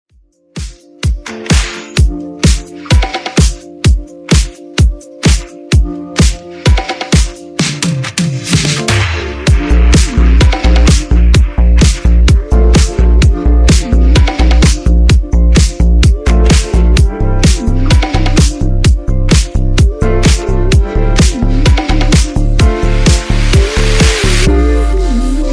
Funk Processed Clean 128 Bpm

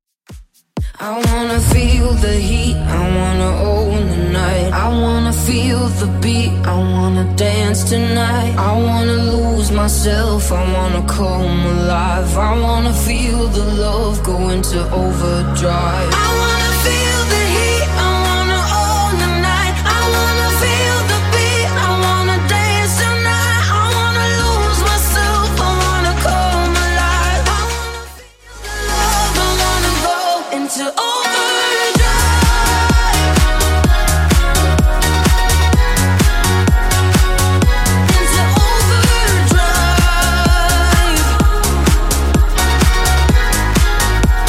Bootleg House Redrum 127bpm

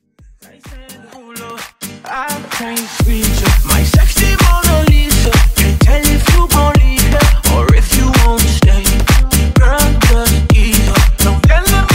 top40 house mashup

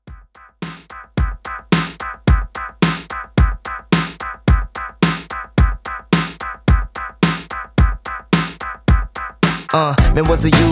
Freestyle, 115 Bpm; Redrum

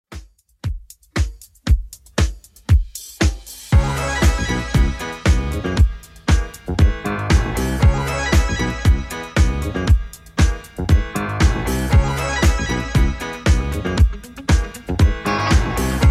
80s Redrum, 117 bpm

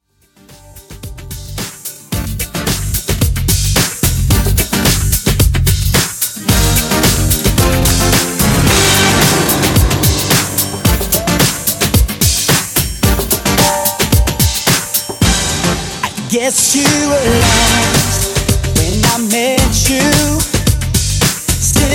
ReDrum 110Bpm

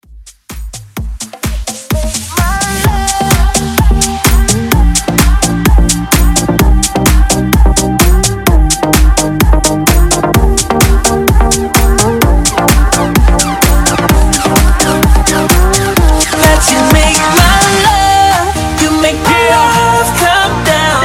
Club Rework 128 BPM

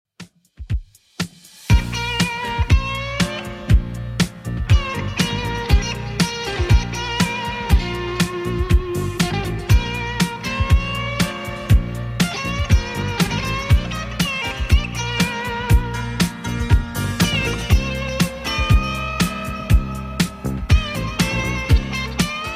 70s Redrum 120bpm

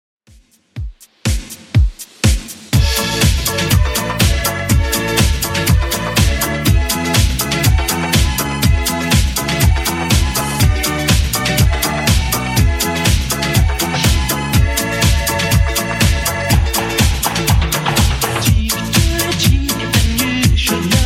80s Redrum 122bpm

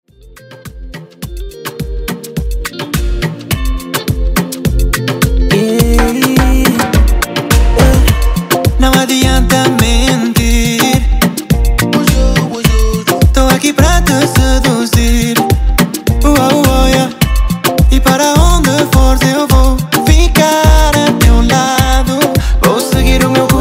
Afro Redrum

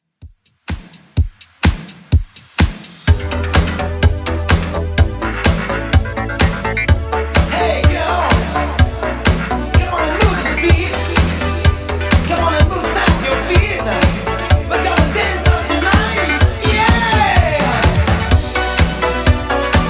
80s Redrum 126bpm

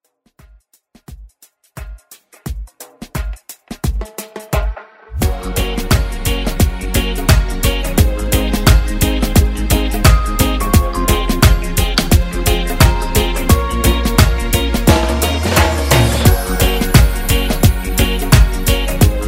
Reggae Redrum

